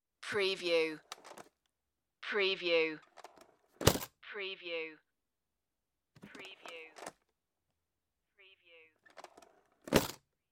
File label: aluminium suitcase